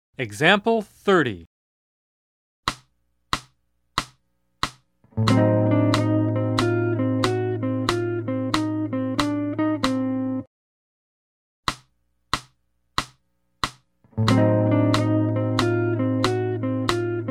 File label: Jazz